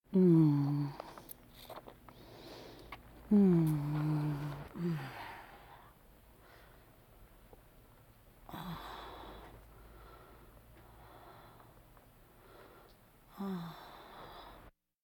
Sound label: Vocal effects breathing Moaning Baby